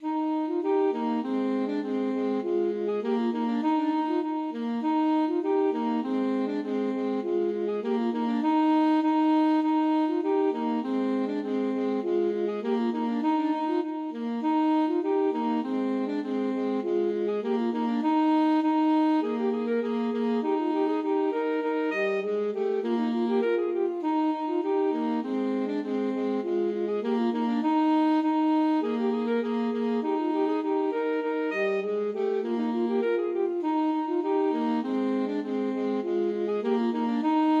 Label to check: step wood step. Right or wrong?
Wrong